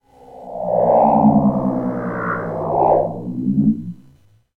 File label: UFO; Ambient FX